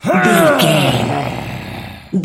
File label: voice male growl effort